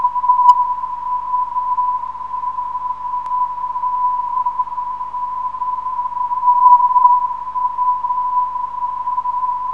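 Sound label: 371.5 kHz, non-directional beacon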